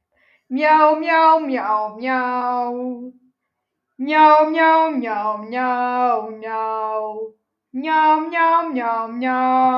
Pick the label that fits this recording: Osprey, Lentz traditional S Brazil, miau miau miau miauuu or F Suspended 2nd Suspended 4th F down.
miau miau miau miauuu